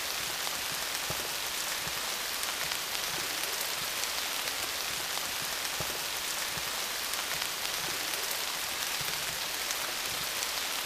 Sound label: Rain Sounds, Spa and Yoga Rain Sounds; Nature